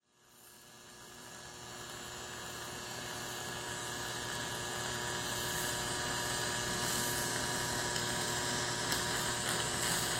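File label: sounds of welding machine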